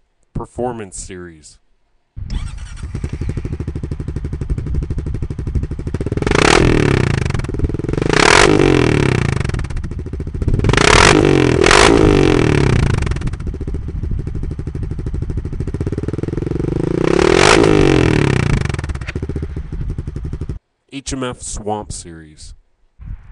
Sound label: honda rancher